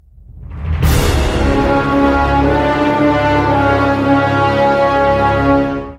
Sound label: Horn; Taken King